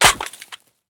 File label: riffle drop grass; STALKER; IWP